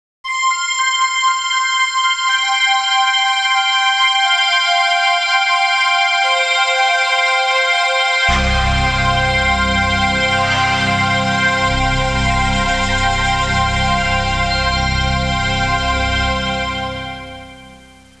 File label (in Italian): musica newage